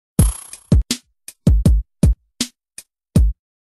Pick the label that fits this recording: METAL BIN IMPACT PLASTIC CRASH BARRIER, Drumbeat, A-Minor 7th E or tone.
Drumbeat